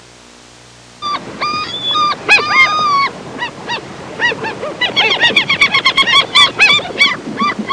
Small dog crying in pain

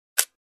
Toy Gun Trigger

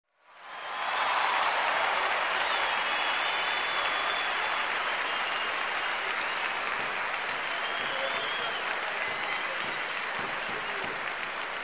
Concert Audience Applause and Cheers

